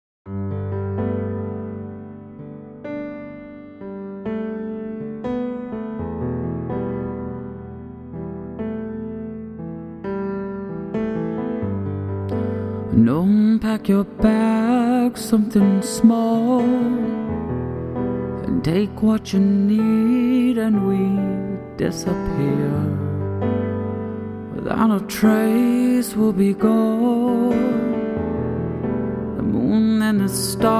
Solo Voice And Piano

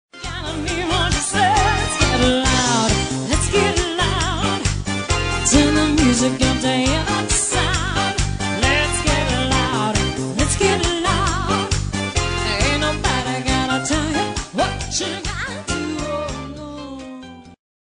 Live Band

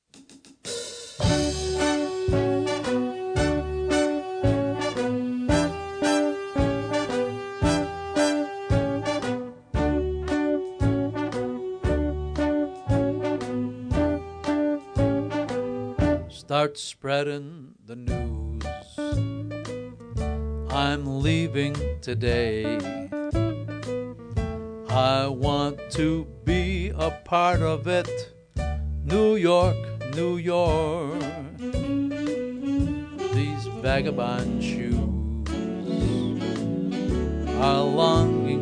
Swing

